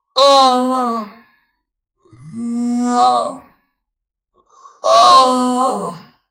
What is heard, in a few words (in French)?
unique son, aggressive